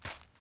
land; Ben 10 - Hero Time Game; stinkfly

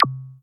hint point; Nickelodeon - Back to School Search

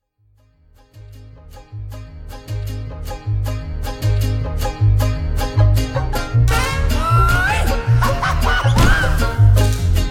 rooftop music intro; The Adventures of Puss In Boots - Barrel Run; NextlevelGames

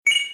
coin; Ben 10 - Tomb Of Doom

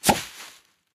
wardrobe attach outfit scarecrow; run-dorothy

